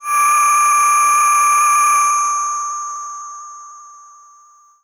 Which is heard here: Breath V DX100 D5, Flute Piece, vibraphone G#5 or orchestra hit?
Breath V DX100 D5